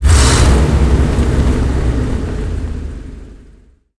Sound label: m5a engine load, tanks; engines; War Thunder